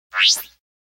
computer bleep; NCH Library

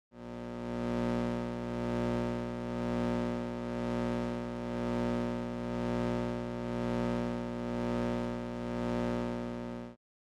Electric Hum Buzz Classic; Hollywood Edge; sound designer's toolkit v2

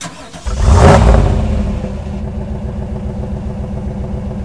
98 Trans Am Start Loop, Automobiles

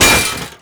Armour Helmet Hit Shatter; SFX Kit; Creaks, Scrapes, & Misc

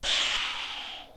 door open; Apotheorasis

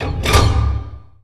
Door Large Metal Open; Doors

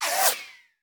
Bullet Pass By; Sound Ideas; Guns

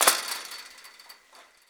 fence; firefight

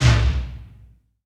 Menu Select

